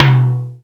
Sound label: tom hi; Korg; DDD-5